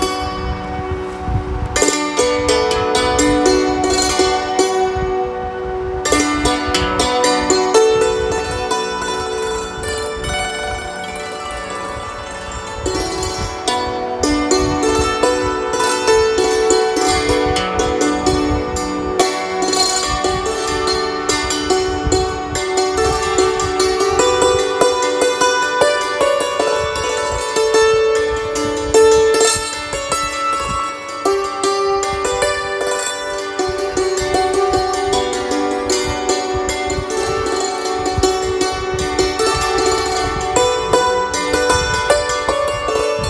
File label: Santoor Musical